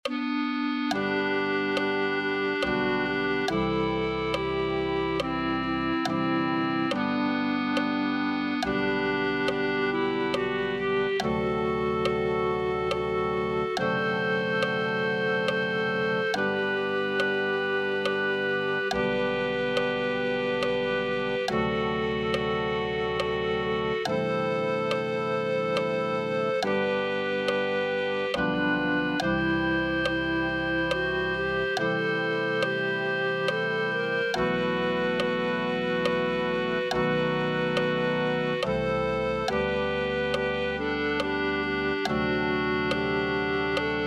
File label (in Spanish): clarinete